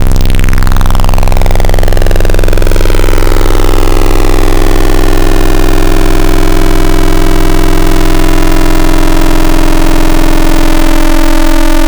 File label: Ears Ringing, FM Wavetables; Cymatics; Virus Wavetables; Serum